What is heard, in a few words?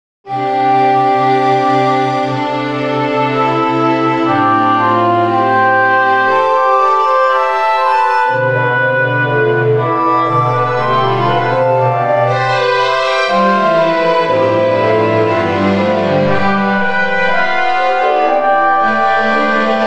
orchestra